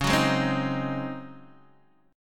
Db Major 7th Suspended 2nd Suspended 4th Db Guitar DADGAD